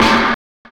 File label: Snare, Drum and Bass